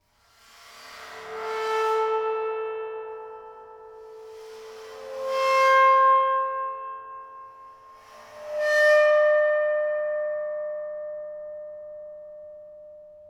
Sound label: pitches, bowed cymbals